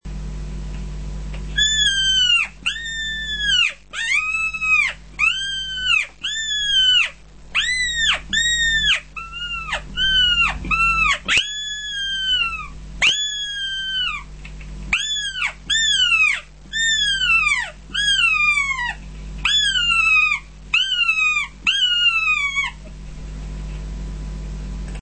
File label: fox call